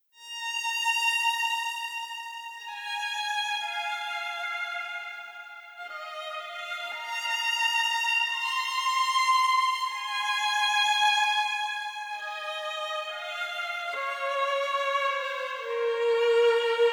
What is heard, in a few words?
Phrase Violin Solo; Montague's Mount; ExploringTheIsland